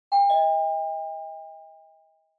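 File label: Doorbell Mechanical Tone Far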